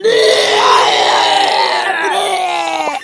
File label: vomit be like